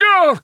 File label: Wizard Pain